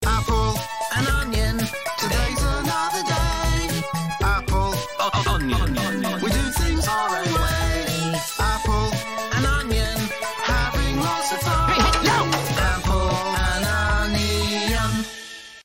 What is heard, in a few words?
title music; Apple & Onion - Trollydash